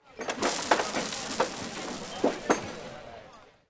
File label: granary; PHARAOH